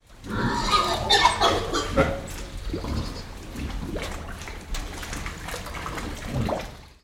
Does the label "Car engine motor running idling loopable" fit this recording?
No